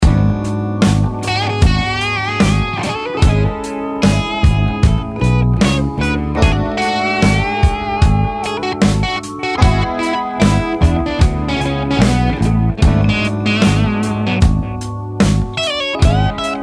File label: G sharp Locrian; Derivative